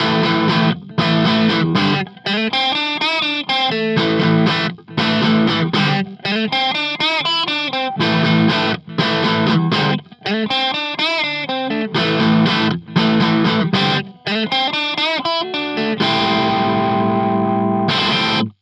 Super Clean

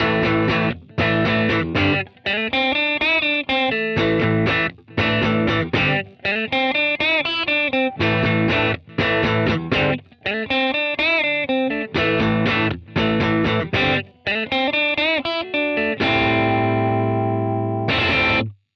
TweedLuxV2

